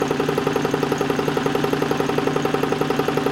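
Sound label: machinery out loop; Garry's Mod; tf2; cp harbor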